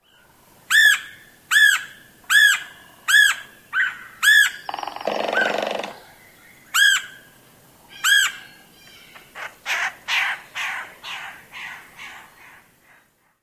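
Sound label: querr calls, drum, and flight churr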